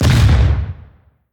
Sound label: Monster Generic Foley Land; Rampage